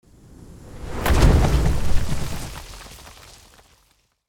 Dragon taking flight wings flapping earth shaking

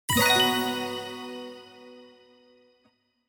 Button positive notification

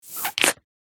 Empty gel bottle squeezing and release

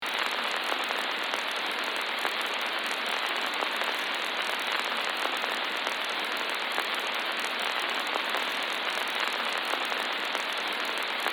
Film reel old projector vintage crackle; film and tv

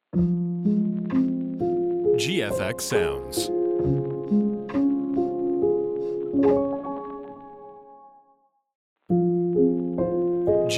lo fi pack; Twitch

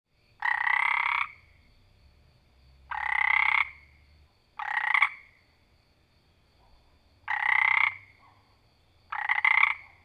Single frog croaking close perspective

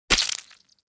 Cartoon splat

